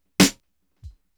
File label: SNR REV; Snares